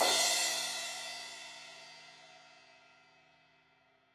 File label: Crash 16in edge, Cymbals; Deeply Sampled Drums